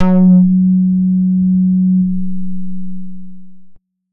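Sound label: 90s club bass F sharp 3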